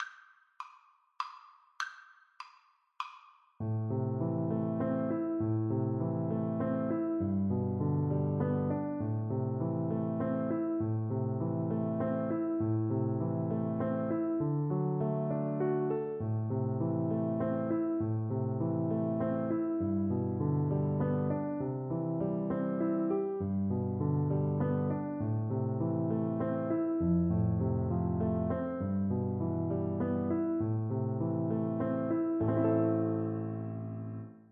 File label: Oboe